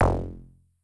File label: skill change; dos lem